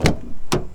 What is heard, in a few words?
Driver S Door Open Mono